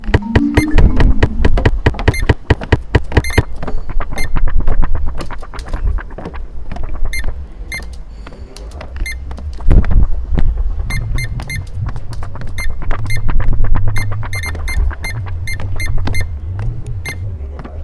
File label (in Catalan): galo grú